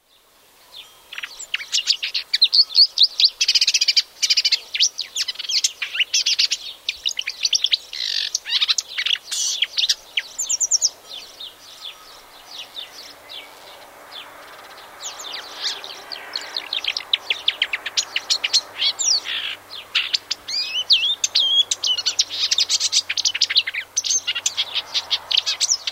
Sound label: icterine warbler